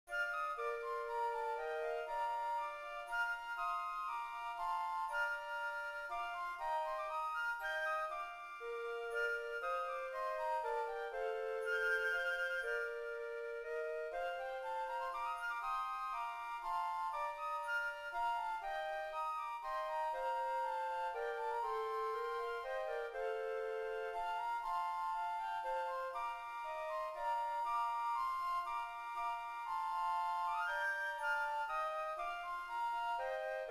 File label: Hymn Flutes; Hymn 574